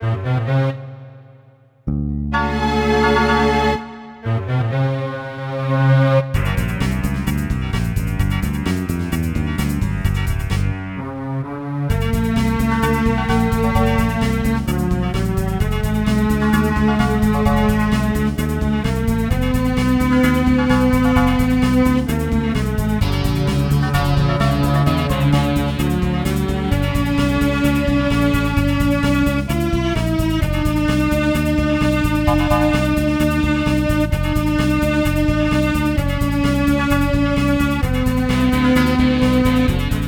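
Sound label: background music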